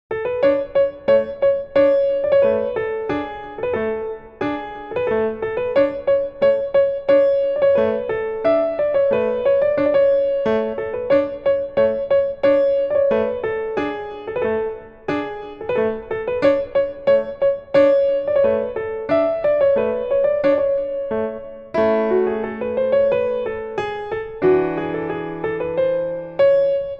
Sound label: Piano Solo; Ruler by Default Official Soundtrack